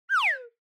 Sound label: Slide Whistle Short Down